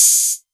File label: 808 Hat O; Novation Drumstation